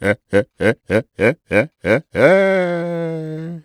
Vocal Chirp, African Voices

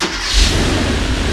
JET START; Rubber Chicken Software Sample Bank

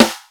R8 snare; Greytsounds VOL-1; TAILORED R8

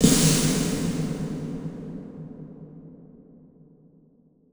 Huge Verb Tail, Long Reverb Tails; impact samples

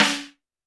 Snare, Roland TD-20

